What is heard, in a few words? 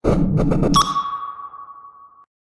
advanced beacon ping; Barotrauma; barotrauma ek